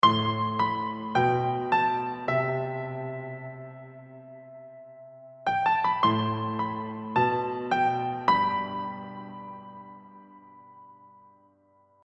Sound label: Chorused Piano